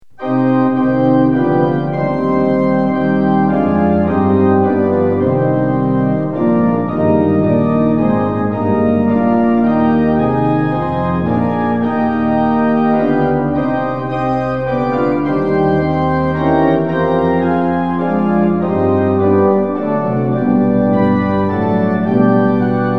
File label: tune; hymns; lillenas